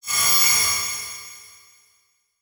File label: Transition Whoosh Laser; Production Element